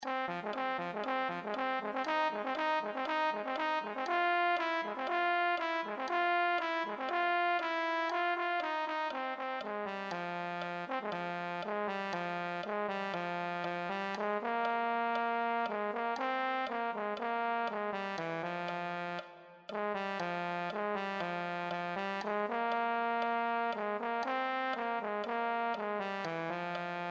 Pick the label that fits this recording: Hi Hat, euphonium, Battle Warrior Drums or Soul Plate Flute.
euphonium